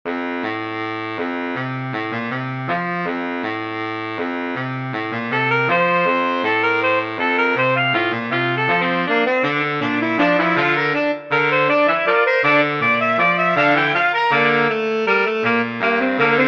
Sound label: Quartetto Di Sassofoni MIDI